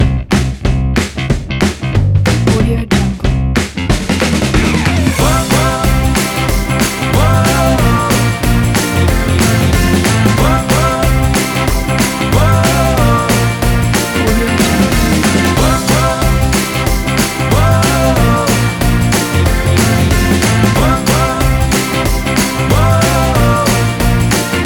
indie vacation energy logo

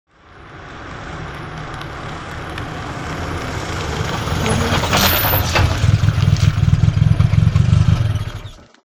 sports car approaches up and switches off; hollywoodedge

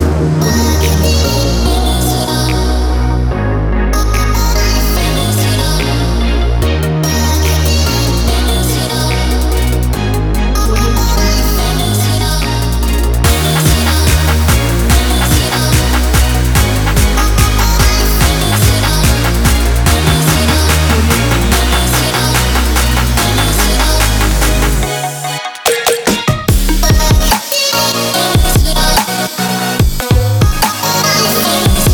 future bass in urban

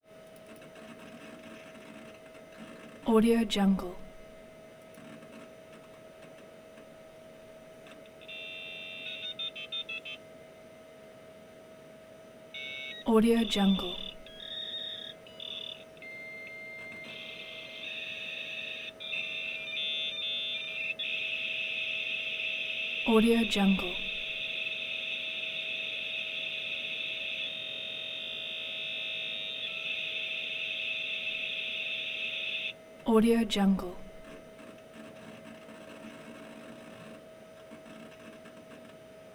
modem compaq pc dial up modem; prosoundfx

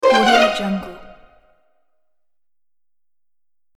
orchestral game pickup fail

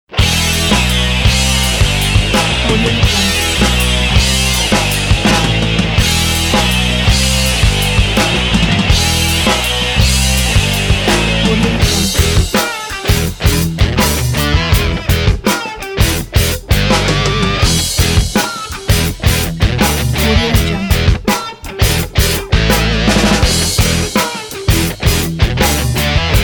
drive indie rock

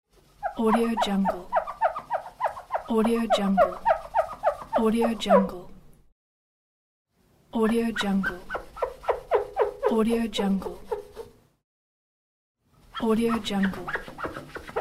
wipe glass or mirror

